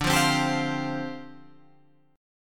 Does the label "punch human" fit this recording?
No